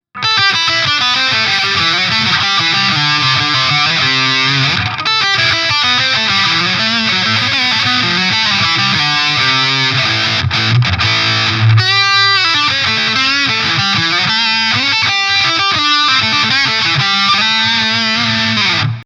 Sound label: Electro-Harmonix Nano Metal Muff Distortion; Tr. 3h; Mids 12h